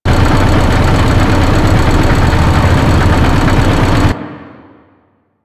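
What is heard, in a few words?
Settlement background machine loop; Ambience; Warframe; Grineer Settlement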